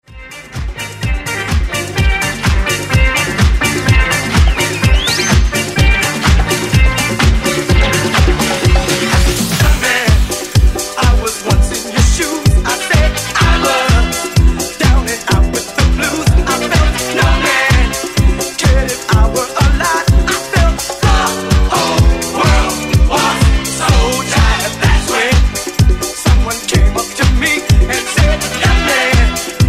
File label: Disco Mix